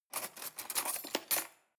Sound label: cutlery cake forks drawer grab